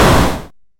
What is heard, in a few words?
bomb explode; Weapons; freepixel